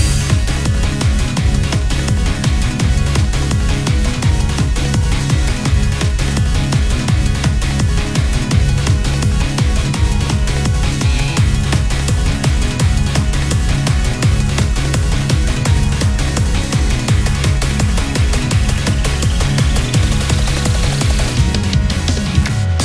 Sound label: Battle; ALTDEUS Beyond Chronos; Android